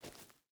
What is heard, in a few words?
footstep burlap gravel walk; Rust